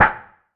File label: perc organic tek